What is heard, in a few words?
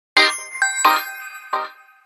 2 Second Message Tone Htc